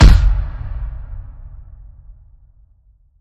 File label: Goal Post Hit